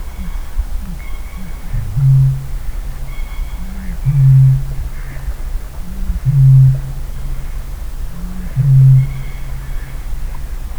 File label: australasian bittern booming; harts creek